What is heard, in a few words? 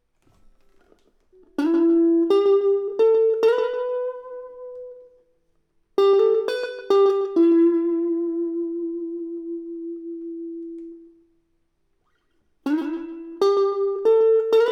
Elect Guitar